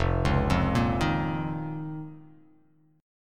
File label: F 7th Suspended 4th Sharp 5th F down; F7sus4#5; arpeggiated; JGuitar